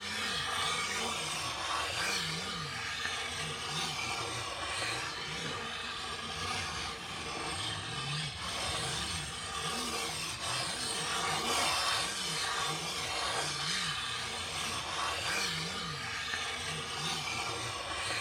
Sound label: Joystick Edge Scrape; Deathrun